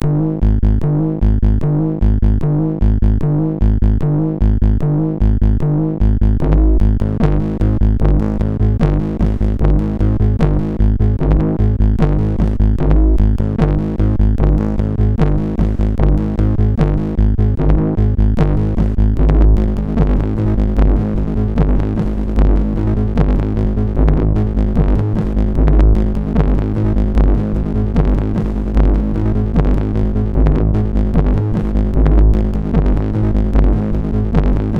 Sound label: Small Riff, sid MOS6581